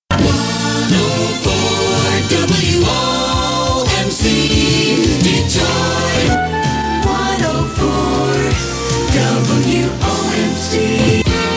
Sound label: jingles; womc